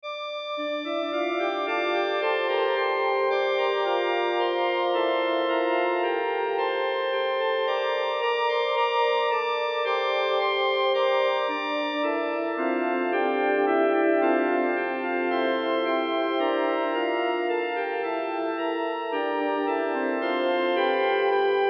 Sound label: organ